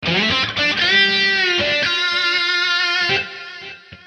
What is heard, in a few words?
Dm 120 lead